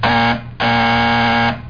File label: paranal software buzzer